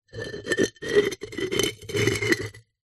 household pot ceramic base lid scrape

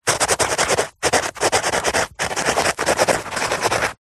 pen ballpoint writing on tissue paper; office

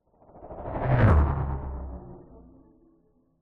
science fiction hovering vehicle pass by fast

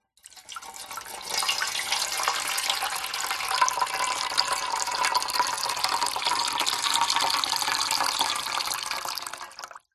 household water pour slow into metal tin tray

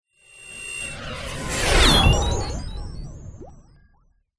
science fiction robot burst

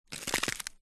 ice footsteps thin ice walk single; silverplatter audio

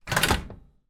household oven grills door open

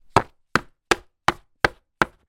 money notes bills wad bang table straighten tidy; zapsplat

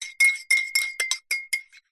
stir coffee mug spoon

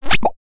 cartoon squeeze pop

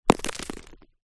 impact rock small hit solid ground

